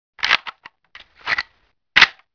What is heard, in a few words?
Rifle cock